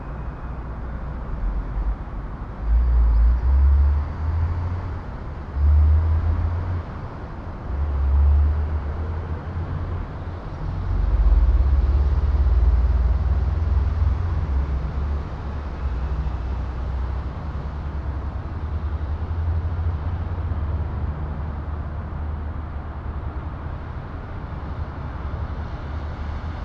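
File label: Urban traffic moderate busy; urban and suburban; boom library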